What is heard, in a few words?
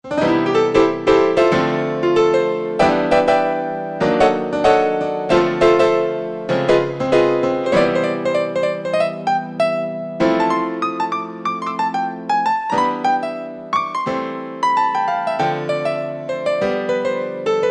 Piano, Yamaha TG33; Factory Presets